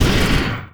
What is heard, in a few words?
Flame Thrower Shot End; Weapons